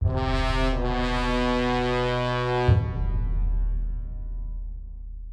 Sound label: Death; gokulTheme